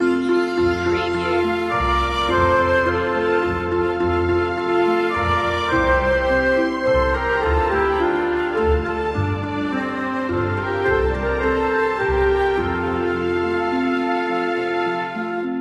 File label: orchestral loop; the holly and the ivy; media music now